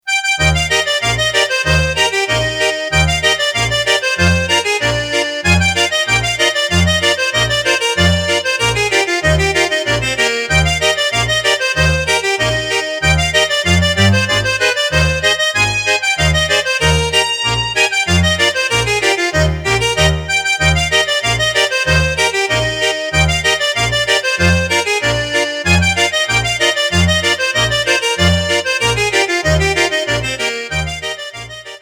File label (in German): Muothataler Schottisch